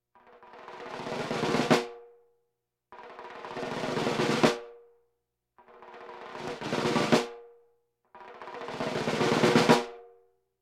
Percussion Bongos Tympani rolls Gongs Drums

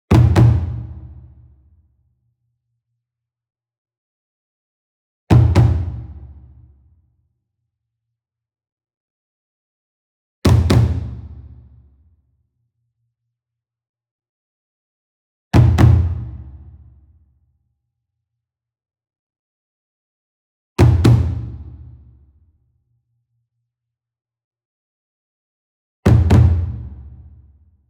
ENSEMBLE DRUM Double Medium; boom library; Cinematic Strikes Construction Kit

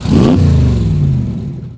Cobra Rev, Automobiles

